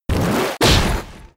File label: Vacuum Wave